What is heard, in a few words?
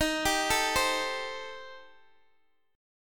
D sharp 6th Flat 5th, Guitar, Open D